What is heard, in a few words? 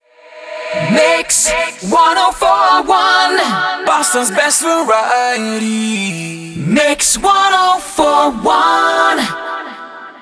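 124bpm MIX, jingle; WBMX 2017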